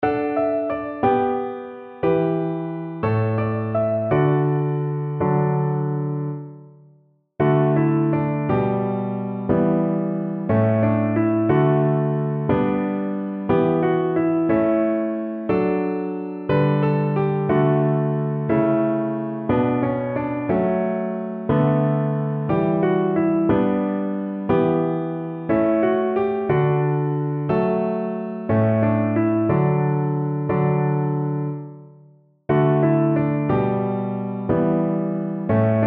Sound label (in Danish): Gruppen. Klaver 3 stemmer. D dur. Mellem. 3 vers